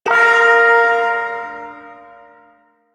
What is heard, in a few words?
Shawzin Chromatic Chord; Warframe; Duviri Ersatz Shawzin